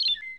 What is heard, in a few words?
Nature Default